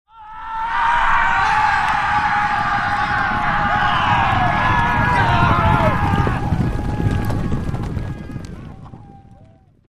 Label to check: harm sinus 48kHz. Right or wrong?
Wrong